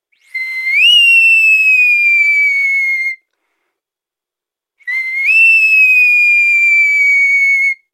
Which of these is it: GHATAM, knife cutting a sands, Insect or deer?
deer